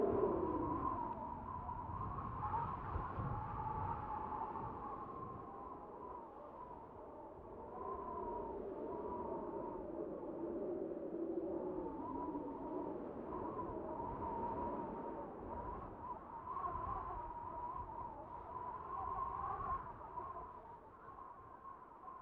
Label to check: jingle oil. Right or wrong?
Wrong